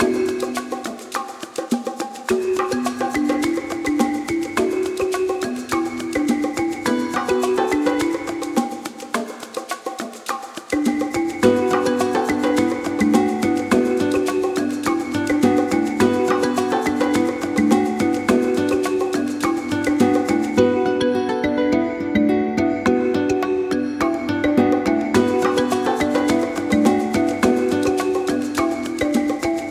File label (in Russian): afrikanskij konga, Музыкальные подложки